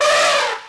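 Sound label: Elephant Attack Vox; Civ4 warlords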